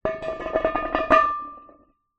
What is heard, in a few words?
industrial manhole cover metal close